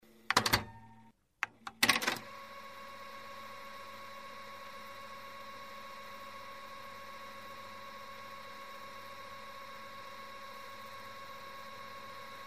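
sounds with a cassette, insertion, rewind, taking out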